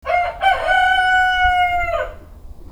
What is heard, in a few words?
cock